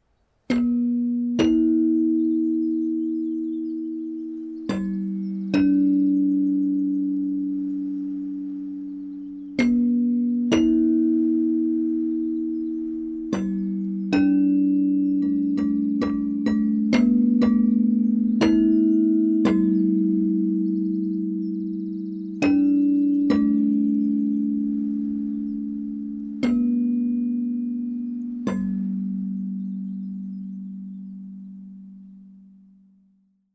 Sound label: xylophone echo piano